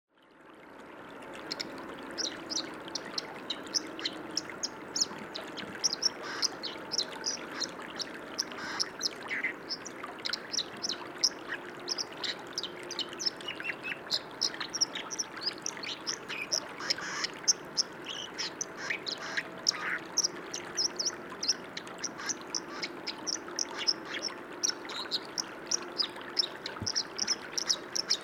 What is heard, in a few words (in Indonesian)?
suara burung dipper